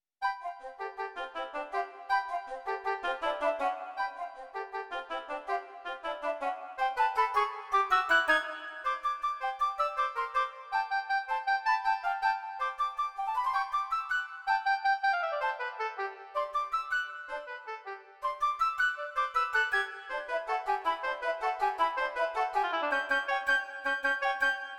bagatelles vivo

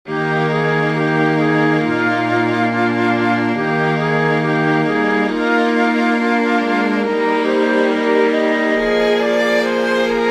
for flute strings; franck cesar; andantino

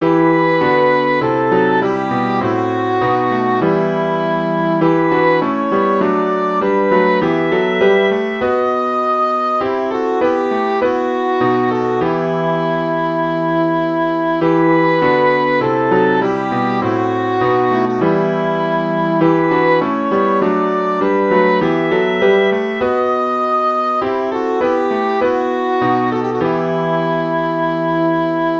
for english horn piano; bach johann sebastian; weicht ihr trauergeister

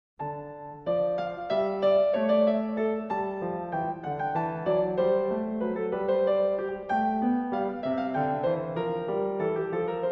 air, hornpipe

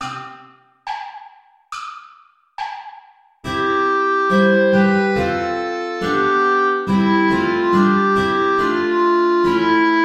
play along without guitar

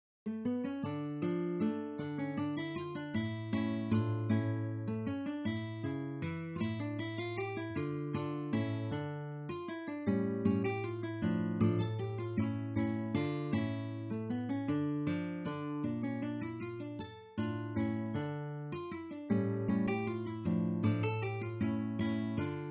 waltzes; Carcassi, Matteo

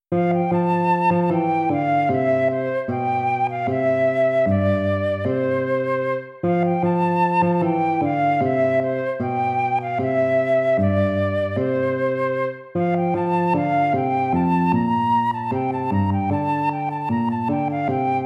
bransle gentil; praetorius michael

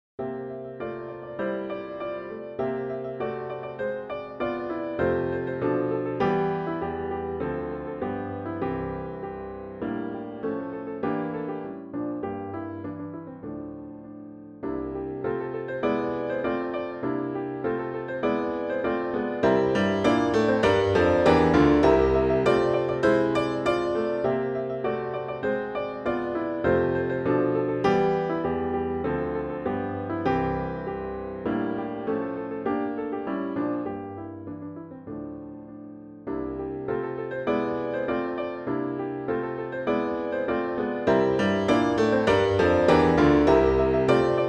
Japanese traditional, festival song; matsuri bayashi

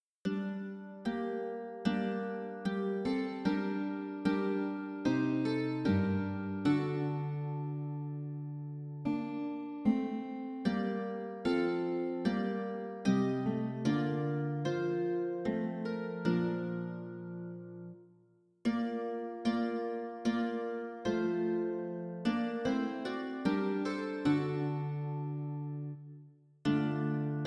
lullaby from lute book organ transcription; william ballet

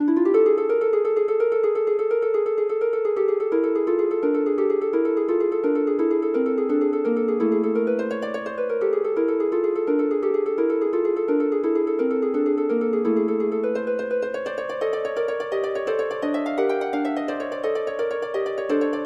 for harp

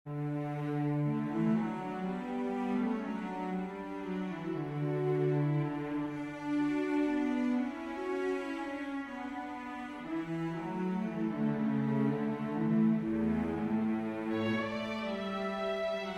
for winds strings; Charpentier Marc-Antoine; les fureurs

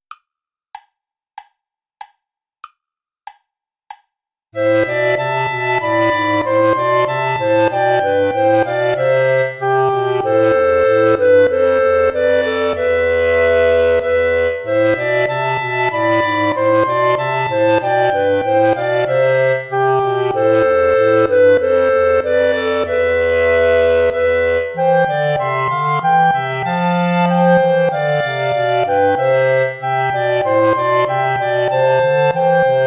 play along without clarinet

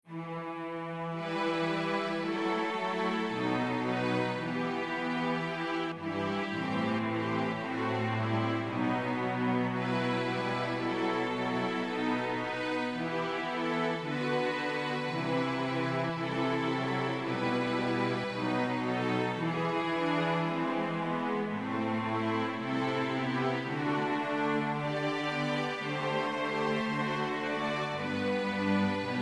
little prelude and fugue minor strings; Johann Sebastian Bach; bwv 555

